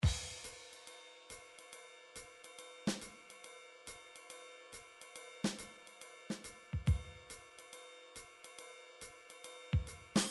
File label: drum midi bigband